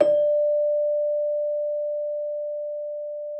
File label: Celesta D5; Xsample VOL-8